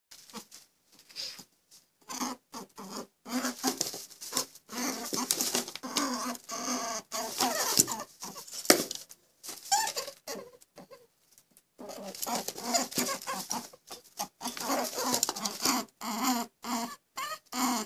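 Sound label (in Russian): krolik golos, rabbit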